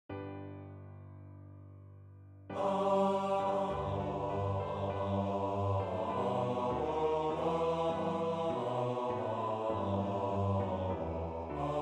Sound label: Ukraine National Anthem Bass